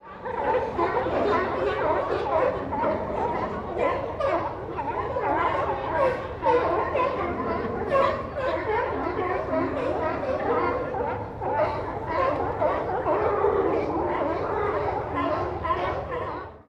sealion

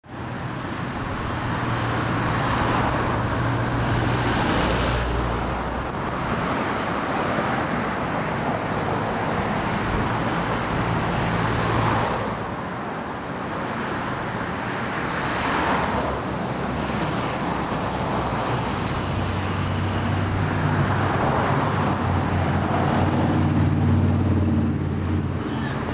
street traffic

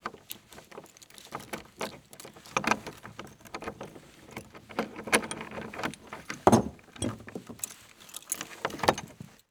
padlock open

